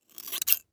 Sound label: Scissors Cut Hair, Household